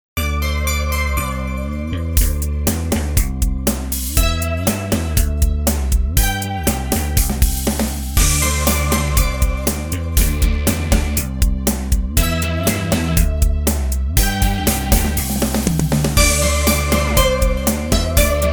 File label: ringtone